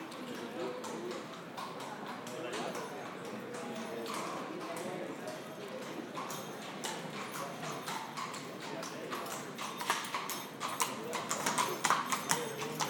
Horse At Walk

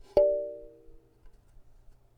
Kalimba D4 Wipe Soft

